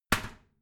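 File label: toilet seat lid slam regular, bathroom; haus-garten; badezimmer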